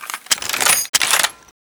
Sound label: awm bolt